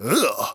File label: Dry Chant - "Ewugh"; Cymatics; Euphoria Vocal Sample Pack; Chants